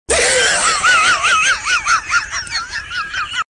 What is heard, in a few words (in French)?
rire derapage; porto